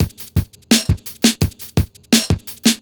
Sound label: Beat, Futurist Drum & Bass; Drums & Percussion